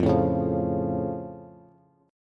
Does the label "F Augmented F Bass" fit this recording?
Yes